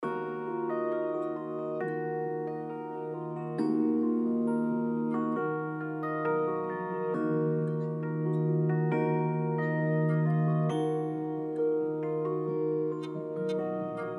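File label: Serene Melody Loop A minor 135 BPM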